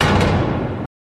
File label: Space Door Metallic, electric doors